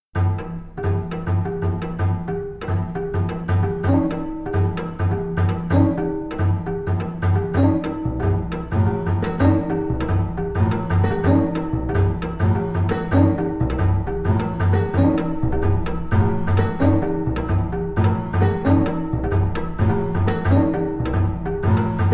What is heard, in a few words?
rainforest